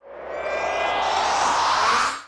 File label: storm cannon